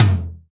Dr. Rhythm 550, digital; Sound & Vision - Gigapack I

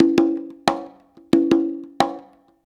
90 CONGA, PERC

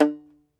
Tenor Banjo, Plucked Strings

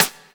SD, HIP, Dance Mega Drums

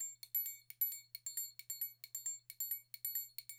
LOOPS 132, NYC Percussionworks; Sampleheads

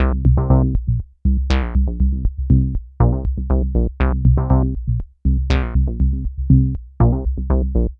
Bassline, Prototechno

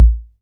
Kick B, Korg KR-55B

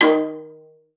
D#3, VIOLA FINGER; Xsample VOL-5

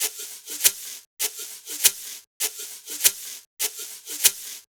HouseHold Shaker (100BPM)

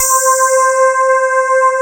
sparkles

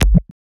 Lat, Kik, One Shots

